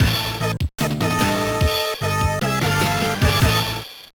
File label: Jingle; zatch bell electric arena; gba